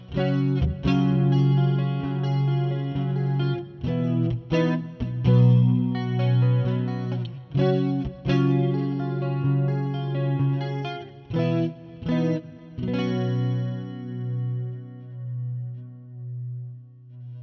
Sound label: Vintage Neck Clean, pickups; BYOG